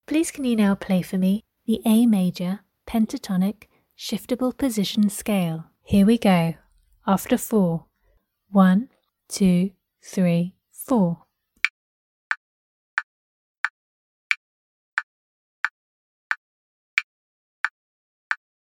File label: a maj pent shift